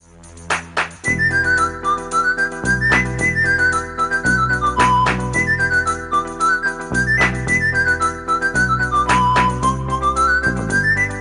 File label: ringtone